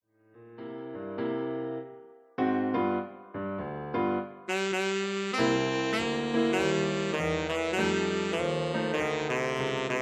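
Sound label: tenor saxophone; solo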